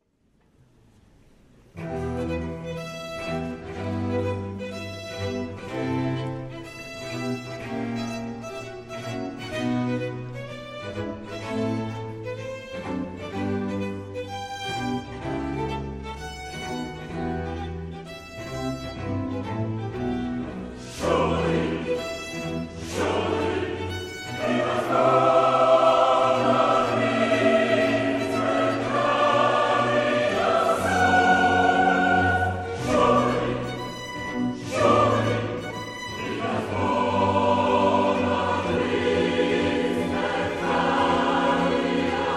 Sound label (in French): Choeur partagé